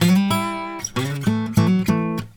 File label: Blues Guitar Samples; ILIO; Fretworks; 96 HIRUM LICK